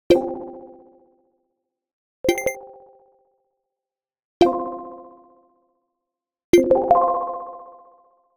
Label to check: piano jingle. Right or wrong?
Wrong